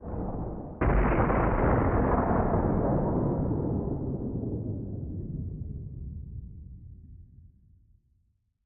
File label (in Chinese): 下界荒地氛围声; ambient